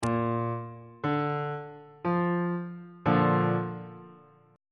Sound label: a sharp major chord